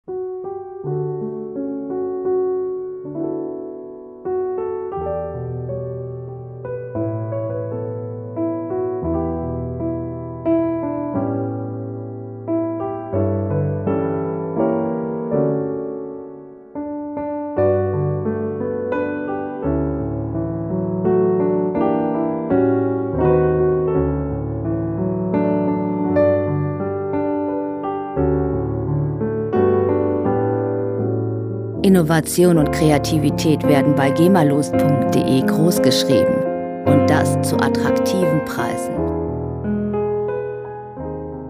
good night jazz

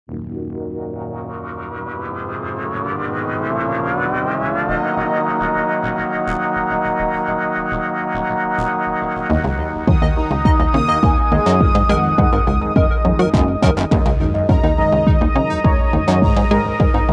analog running

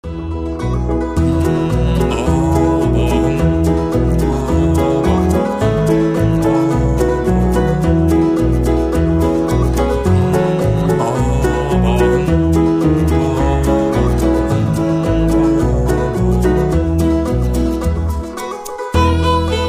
bluegrass

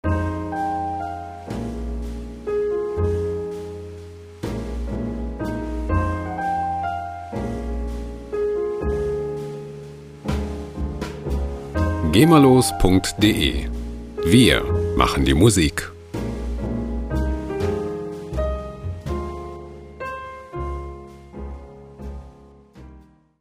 jazz trio

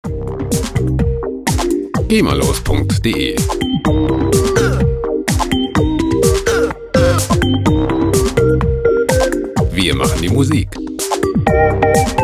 african house

